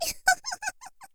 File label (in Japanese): syozyo warai